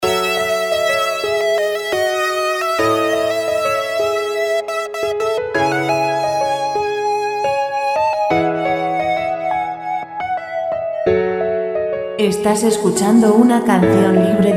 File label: piano lent; piano musica libre